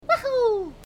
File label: "wahoo"